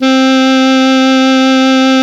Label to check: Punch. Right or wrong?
Wrong